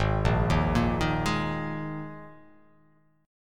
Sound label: Ab Suspended 2nd; arpeggiated; JGuitar